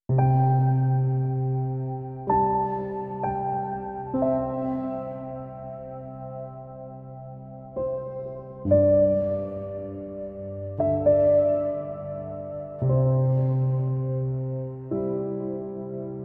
baby piano lullaby

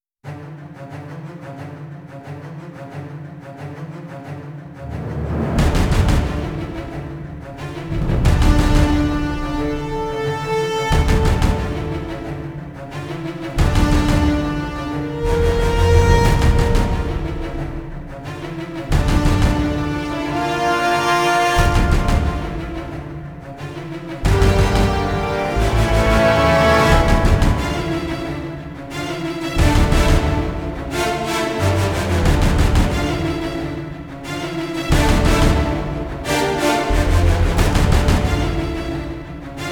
dies irae non choir

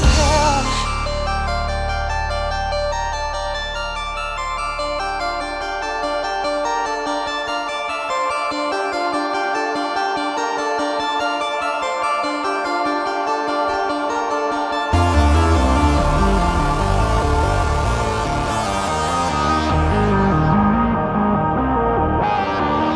frequency modulation

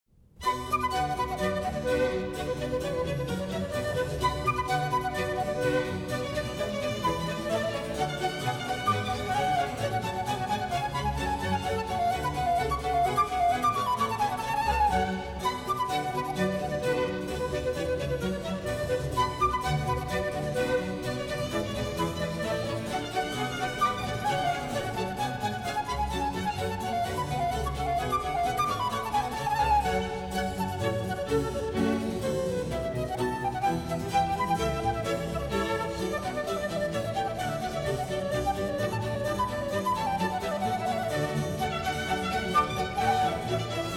orchestral suite no 2 in b minor badinerie; bwv 1067

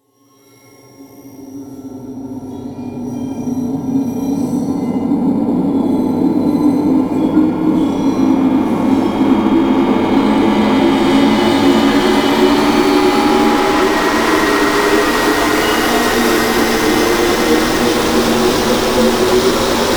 riser ear piercer

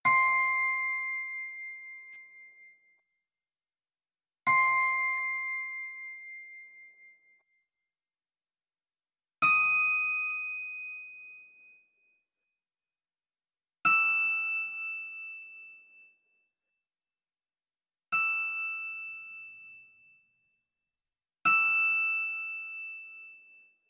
toy box

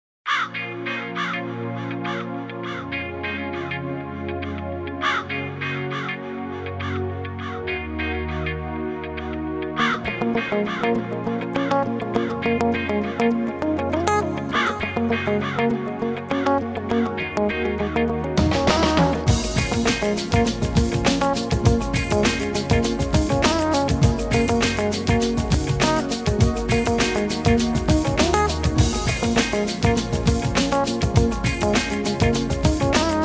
noise mix

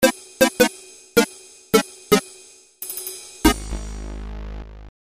game over

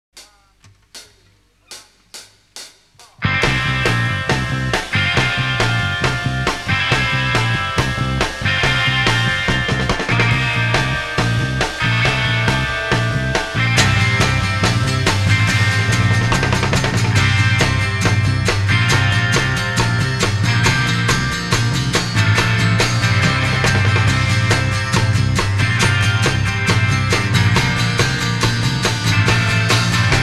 instrumental

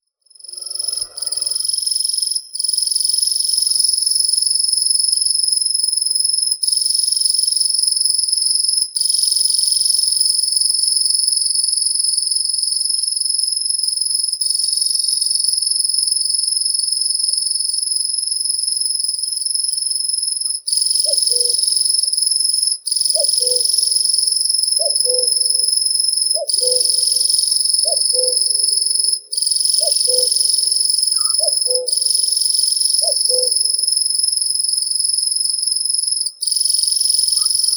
sverchok obiknovennii locustella naevia

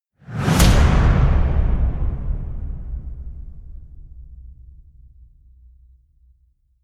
metallic slams puncher with whoosh

